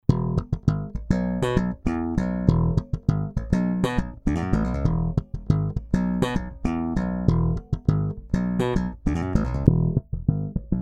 Squier P Bass Funky

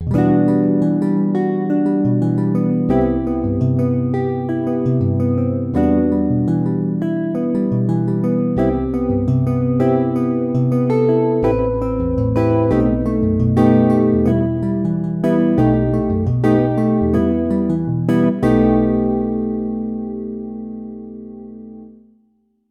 Heaven Guitar

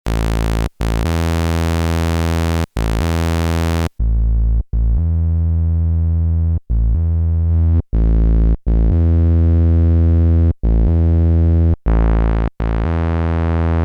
Drive Polybrute; PWM Malevolent

